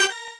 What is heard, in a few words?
normal hit normal